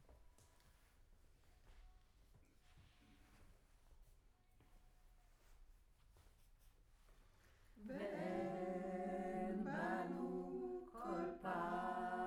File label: Room, Choir